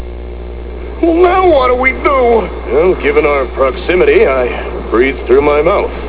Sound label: breathe